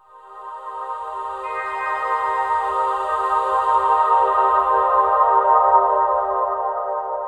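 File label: C minor, Drum & Bass Pads; Total Drum&Bass; 90 s Sample CDs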